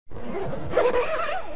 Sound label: texture zip